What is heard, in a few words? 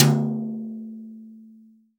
TOM, CTD TOM BRSH